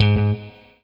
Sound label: ROCK, Guitar